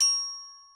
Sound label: gloken